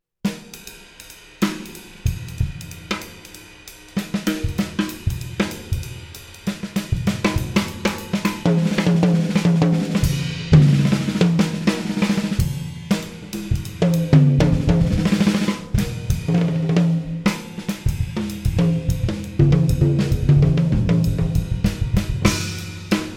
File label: drum track